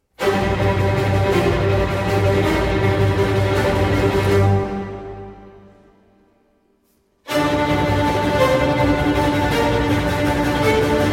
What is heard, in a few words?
Violin Concerto in G Minor, Presto; RV 315, L'Estate